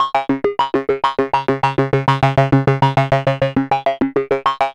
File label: DANCER C3, LFO DANCE